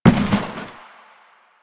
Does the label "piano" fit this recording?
No